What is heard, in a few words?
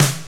SNR FAT, snares; Roland L-CD701; Snares 5; Sn Modules 5